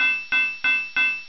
tink tink tink; Tradeskills

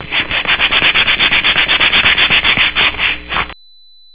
grinding; Tradeskills

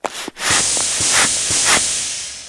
bellow pump; Tradeskills

